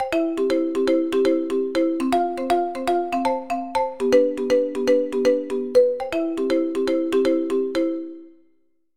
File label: Busy Marimba, alarms; Infinix NOTE 30